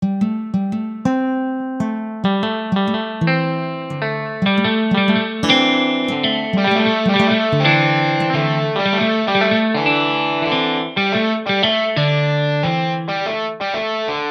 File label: canon